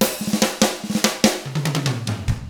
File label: ROCK 6-8, Drums; ILIO; Double Platinum Drums 1